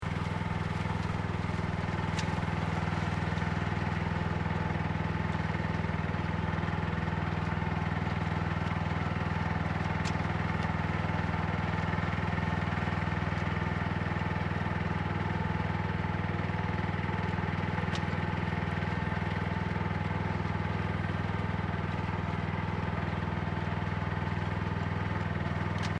Tractor Mechanical Planter Rice Field Operating Distant Loop, Japanese rice planting tractor